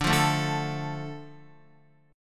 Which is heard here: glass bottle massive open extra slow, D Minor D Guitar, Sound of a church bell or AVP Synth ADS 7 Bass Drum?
D Minor D Guitar